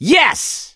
positive noise, gambler, survivor voice

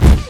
dog footstep, npc; Source Engine; video game

